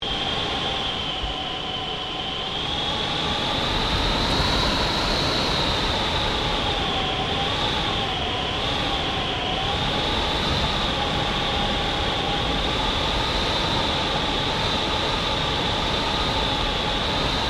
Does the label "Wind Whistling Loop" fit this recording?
Yes